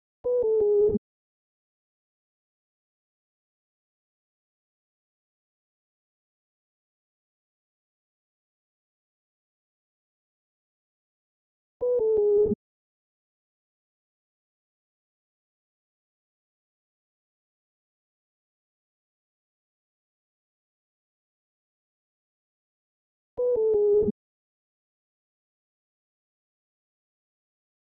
166 BPM C# Min Accent; Loop Stems; Cymatics; Fallin 4 U

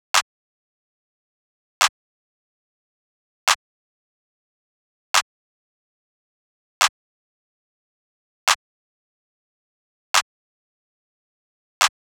Drum Loop 144 BPM Clap; Drum Loops; Loop Stems; Hip Hop; Cymatics; Late Drum Loop

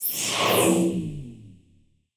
Spaceship FX; Cymatics; Video Game Sample Pack Vol 1

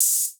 Open Hihat; Cymatics; Humble Hip Hop Sample Pack; Cymbals

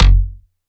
Bass Shot F# Pluck, Dubstep; Ghosthack; Advent Calendar 2018

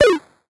Retro FX; Cymatics; Video Game Sample Pack Vol 1

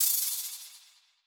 Cymbal, Hip Hop; Drum One Shots; HalfBlock

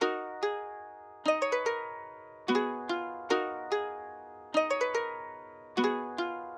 146 BPM D# Min Harp; Loop Stems; Cymatics; Gems Free Teaser Pack; Cymatics Gems Vol 8; Mirrors

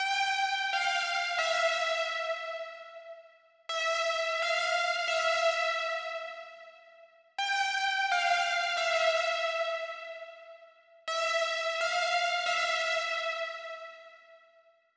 130 BPM F Min Lead, 1980s; Melodies; Loop Stems; Cymatics; GHOST (Beta Pack); Boardwalk

